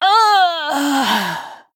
Female Blood Elf Death

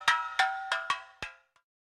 Steel Drum Underlay Attack

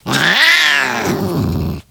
Death; Mr Bigglesworth

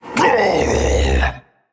Male Tol'vir Death

